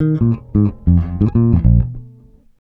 ACID JAZZ

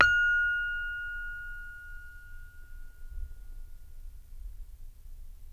SARON F 2; Spectrasonics; Heart of Asia

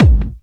hard bass drumz

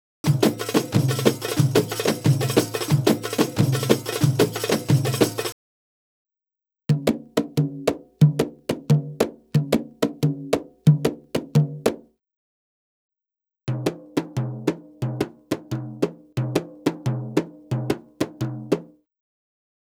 Arabic Rhythms

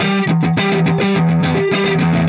Clinical Distorted Funk Guitar

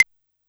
Claves, Yamaha PSR-E323 Standard Kit

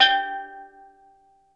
STL. DRUM G3, TONAL PERCS; Crypton - DrumBase5000

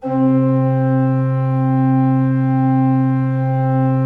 C3, Cathedral Organ; ILIO; Propeller Islands

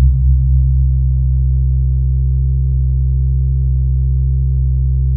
Vox top C2, Fairlight CMI IIe; Keyboards2

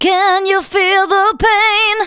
VOCALS SUNG; Techno Trance Essentials

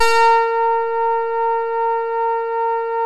EMU MODULAR, modular syn

